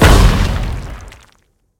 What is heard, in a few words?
wild Explosion; parthenon